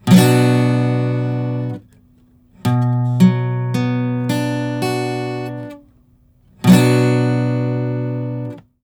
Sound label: Major or Minor